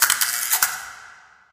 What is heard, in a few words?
light flicker; Source Engine; Portal 2